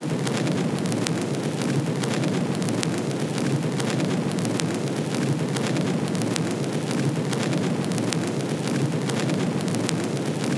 Vinyl Noise; Cymatics; Eternity Sample Pack

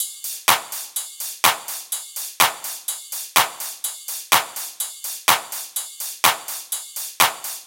Percussion 125Bpm, techno; Percussion Loops; Spring Break; Ghosthack Advent Calendar - Day 6 - Techno Kit

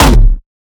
kick oneshot light E; GhostHack

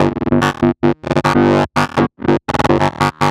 Transistort Grid Loop G 145 BPM, Psytrance; Grid Loops

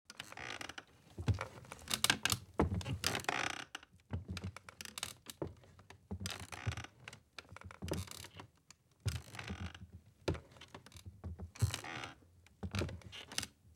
Wood Rocking Chair on Wood; Fairlight Sound Lib

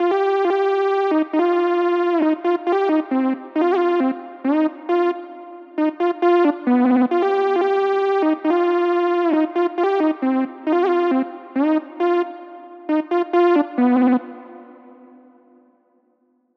135 BPM C Min Juno-60 Lead; Loop Stems; Cymatics; Fantasy Synth Sample Pack; Fantasy - Tell Me; JU60+OBX+REV2

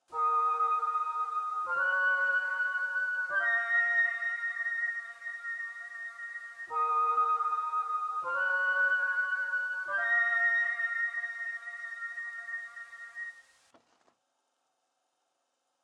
146 BPM D# Min Lead; Trap Melody Loops; Loop Stems; Cymatics; Powers

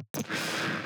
perc oneshot; GhostHack

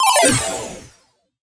Collectibles Sci Fi FX; Video Game; Cymatics; Video Game Sample Pack Vol 1

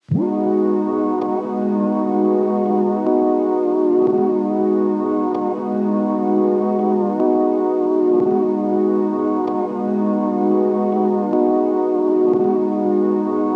Tape Loop Pad, G# Min; Pads; Cymatics - Eternity Sample Pack

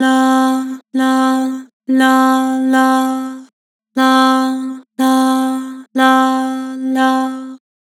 "Lah", Vocals; Harmor

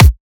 Kick, Drums; One-Shots; Kicks; Ghosthack; Color Bass Essentials

